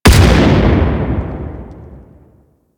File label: Space Ship Turret Fire; Warframe; Grineer Pursuit Space Ship